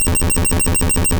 Spark; sfx; attack moves; pokemon; gen 2; gsc

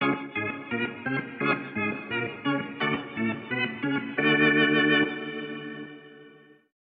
organ

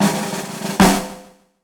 (Jingle) Change Clothes; Nikki's Travel Quiz

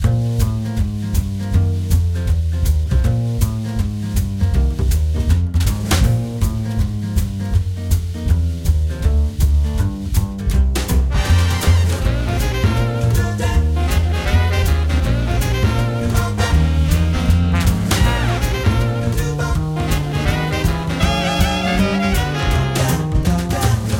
Music High Score; Hammer Heads Deluxe

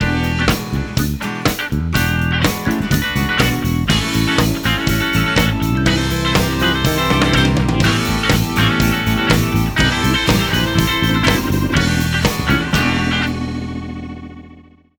Stinger; Mystery Inc.

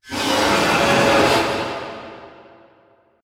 Sword Drag; silent hill homecoming 2008 gamerip pc ps3 x360

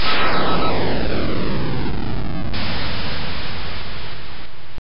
Warpin; tempest 4000

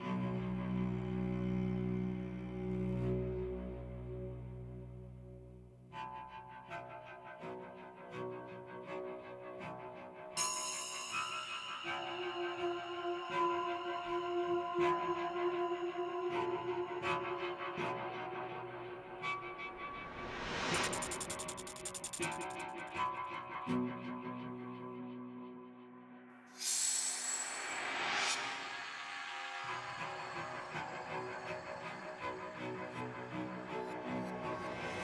Combat Loop; Harley Police

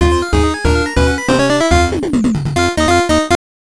unknown jingle; Nester's Funky Bowling; Virtual Boy

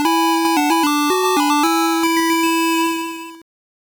Bonus Item Obtained; marko s magic football; game gear

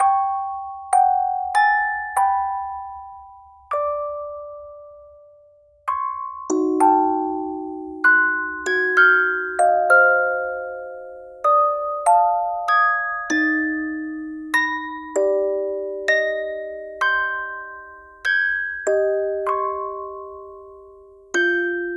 Music Box; the great ace attorney chronicles; Sholmes' Disc Playing Attempt #2

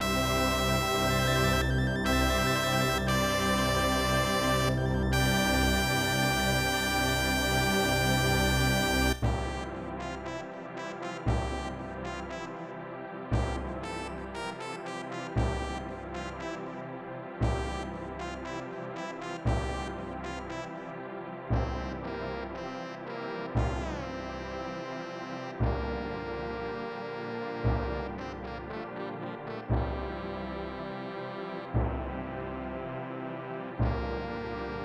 Clear Level; Super Godzilla; SNES

